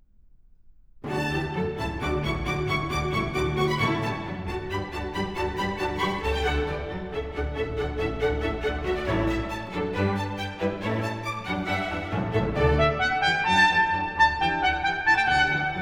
Sinfonia a 4, for trumpets, violins & continuo, Presto